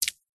foley footstep single barefoot walking wet concrete swiming pool edge